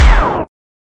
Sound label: close; NFS; Keiikos NFS Classic Archive